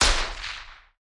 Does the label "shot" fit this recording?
Yes